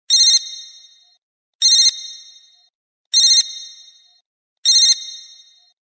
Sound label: Beep